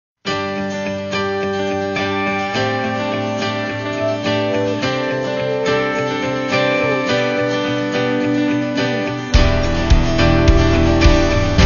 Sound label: Cool Rocking; Nokia